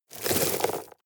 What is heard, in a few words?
sweep small rocks